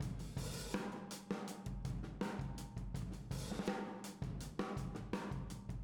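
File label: Boogaloo, Room Mono, 82 BPM; Drums; Verse; Sonic Foundry; Drums from the Big Room The Mixes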